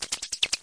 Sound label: space noise bird; Electro and Synthetic